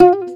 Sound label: SHUR NOTE, TOPSHUR NT